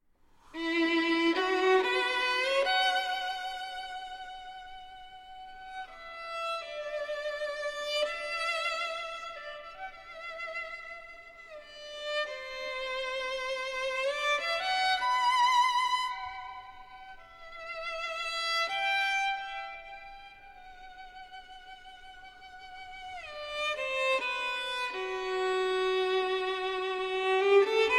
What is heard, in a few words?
Phantasy For Violin and Chamber Orchestra; Boyle